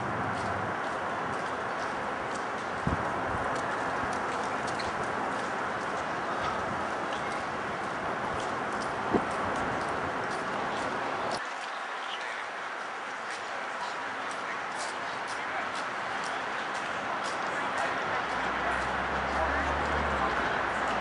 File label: Eastern Phoebe; flycatcher